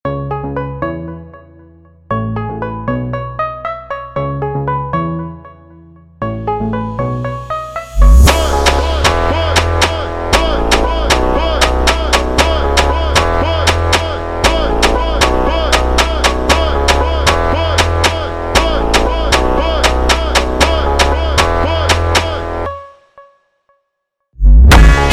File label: Montagem slowed, Phonk Mashup; funk